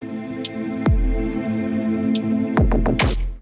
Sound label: Kit groove 140 bpm; MIDI Clips; 133 - 151 bpm; Canopolis Kit; Ableton; Glitch and Wash